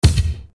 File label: Bass Dr, EuroDance Bdrums